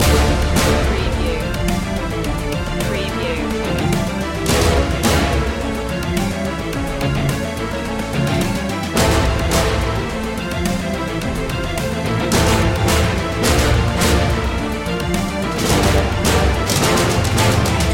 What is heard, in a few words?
battle in 8-bit space loop